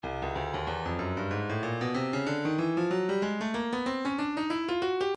stylus percussion midi files tambourines 094 baby tamb